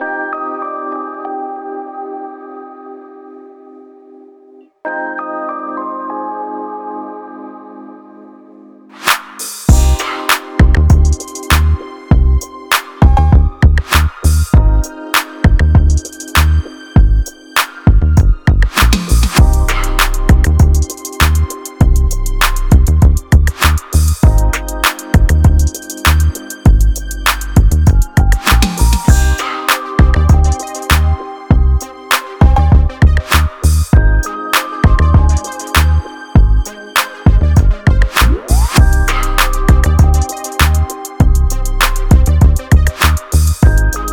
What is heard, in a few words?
Instrumental